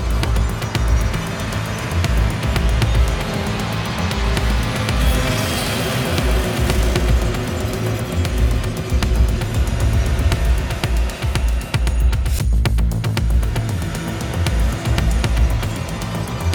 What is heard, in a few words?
Mech Loading Screen, Music; Warframe; Deimos